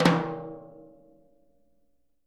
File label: Living Drums, tom; Sampleheads; Peter Erskine Living Drums; JZ XTOM 1